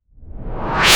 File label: whoosh